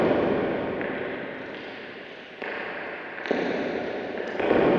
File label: Cracklature; sparse soundscape; Loops